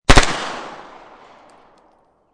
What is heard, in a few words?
MP5 9mm 3 Burst, Machine Gun; Machine Guns; VRSonic